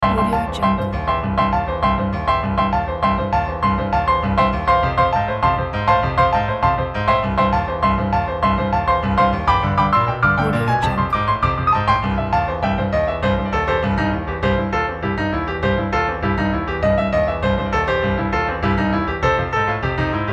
boogie woogie

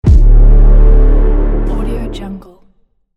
exploision

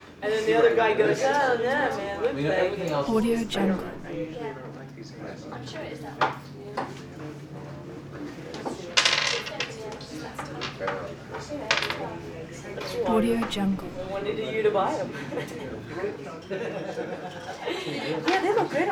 bar walla los angeles; prosoundfx

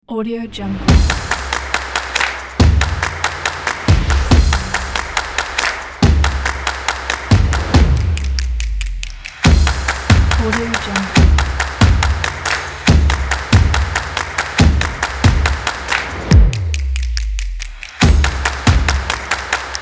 the percussion

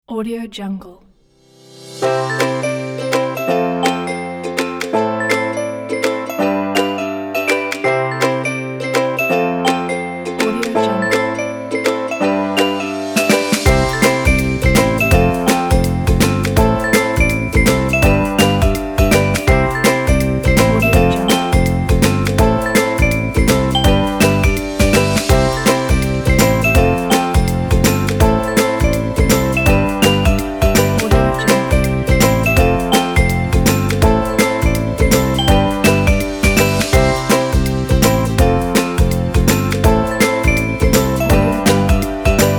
cheerful ukulele happy kids music pack

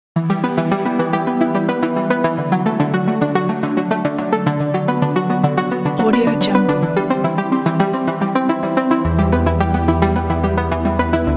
80s synth pop

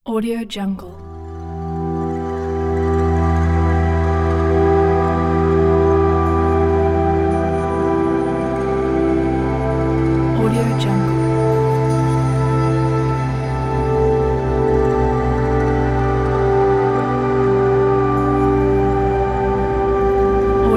relaxing ambient; audioearth

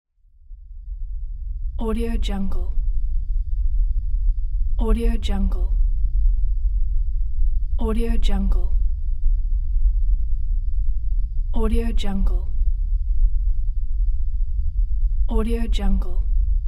spacecraft engine hum

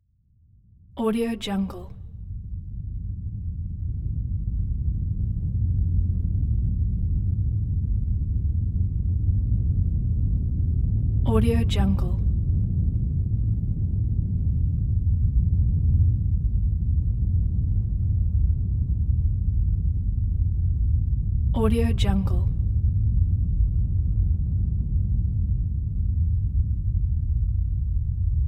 earthquake

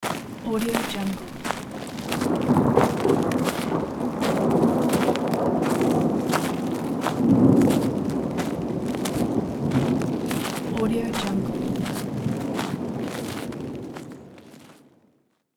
footsteps on snow in the rain and thunderstorm

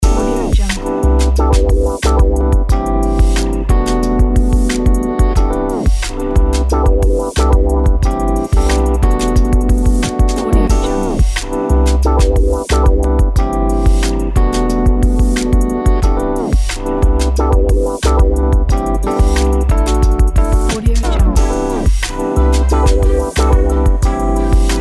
summer rnb loop

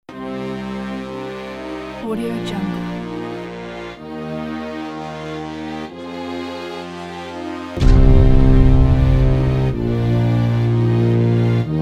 dark ominous orchestral

